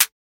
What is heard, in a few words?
Clap